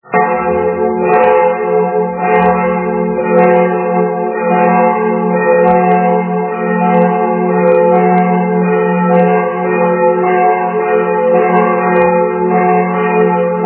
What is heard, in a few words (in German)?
kirchenglocken lenzen; lenzkirch